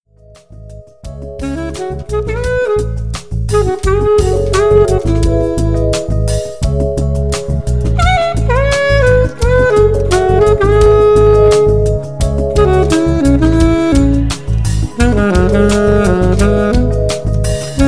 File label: Saxophonist Lounge